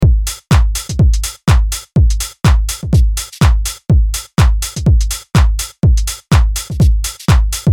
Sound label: House Deep House 124BPM